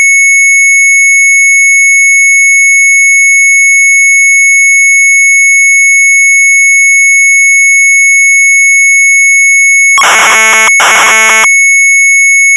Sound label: MZ800 Tape, sharp; VARICOM-UITLEG; retromelsarchive